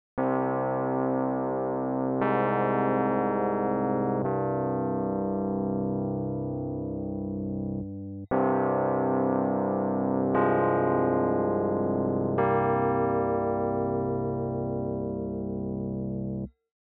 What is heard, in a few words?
Classic Electric Piano, 118bpm; spinebashed; Bridge